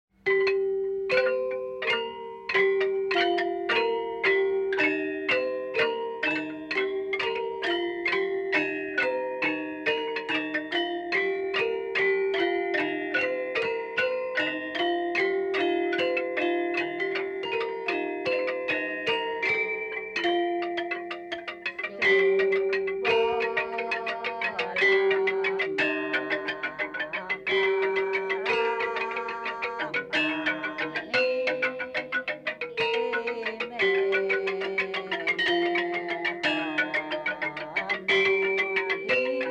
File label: kidung gambang; Asak